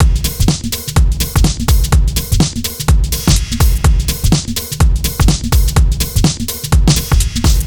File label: Full Loop 125, Loungin House; Equipped Music